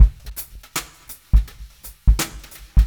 083 Pop Brush Drums; Pop Rock; Moderate Tempo; Pro Tools Essential Loops; Digidesign

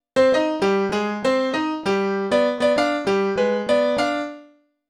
098 Latin Jazz Piano Cmaj, Grand Piano; Digidesign; Pro Tools Essential Loops

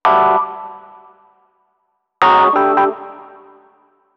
095 Acid Jazz MarkII Ebmaj; Piano; E-Piano; Pro Tools Essential Loops; Digidesign

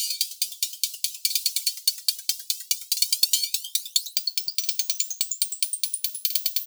144 Techno Hats Fx; Dance DJ; Drums; Pro Tools Essential Loops; Digidesign

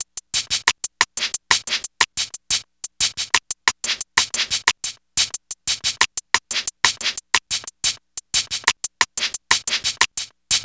E Coast HipHop Drums; Digidesign; Pro Tools; Pro Tools Essential Loops; East Coast

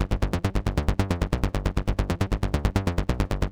136 Techno Bass Gmin; Dance DJ; Digidesign; Pro Tools; Pro Tools Essential Loops

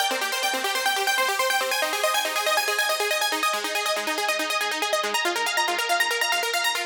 140 House Hi Arpeggio Cmin; Dance; DJ; Synth; Arps; Pro Tools Essential Loops; Digidesign

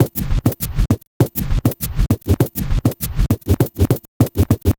Vinyl Scratch Kick; Digidesign; Pro Tools; Pro Tools Essential Loops; Dance DJ

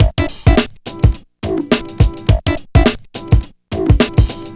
HipHop Groove Break Combo Amaj; Digidesign; Pro Tools Essential Loops

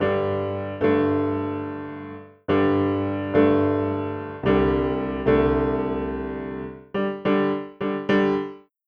Country Piano Intro Alt; Digidesign; Pro Tools; Pro Tools Essential Demos; Essential Loop Demos; Demo Session - Country

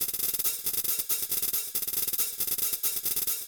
138 Techno Hats; Dance DJ; Drums; Pro Tools Essential Loops; Digidesign

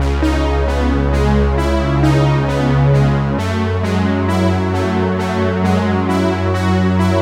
133 House Straight Pad C#Maj; Dance DJ; Synth; Pro Tools Essential Loops; Digidesign

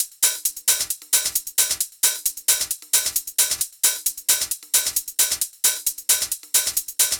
133 House Thin Hi-Hat; Dance DJ; Drums; Hats; Pro Tools Essential Loops; Digidesign

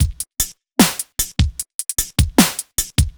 Dance Beat; Dance DJ; Drums; Beats; Pro Tools Essential Loops; Digidesign

